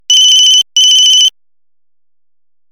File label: old ringtone